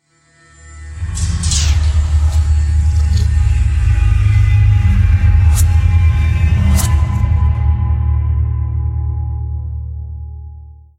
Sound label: Suspense; svencoop